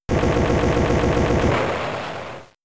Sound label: Explosion; Pokemon; Gen 3; attack moves; RSE